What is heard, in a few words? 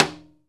MUTED RIM, Timbale; Timbale Battery